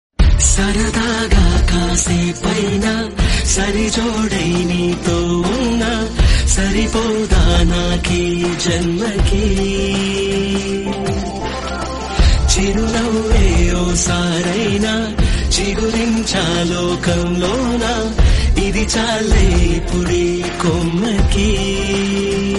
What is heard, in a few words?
telugu bgm instrumental romantic love; Saradaga Kasepu